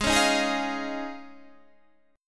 D sharp 7th Suspended 2nd Suspended 4th G sharp

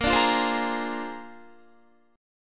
Bb 9th Bb down Guitar 4F,4A,5C,5G,5C,6E; strummed

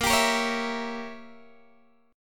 A sharp Diminished 7th, Guitar Open G; x,15,17,15,14,14; strummed

